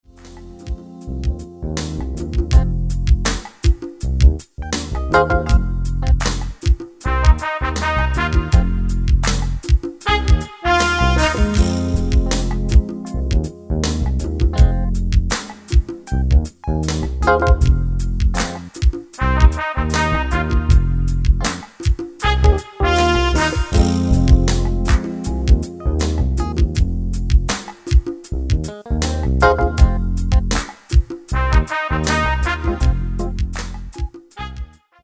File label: Groove On